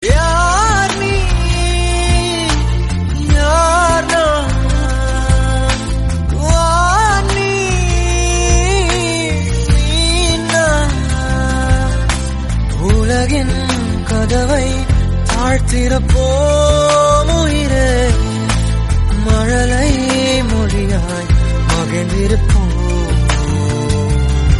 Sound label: Tamil Song